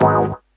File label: chords